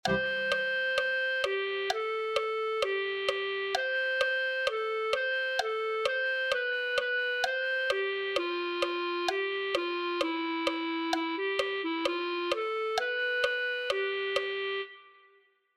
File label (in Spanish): clarinete